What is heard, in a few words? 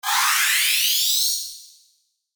Speed Up; GGJ2022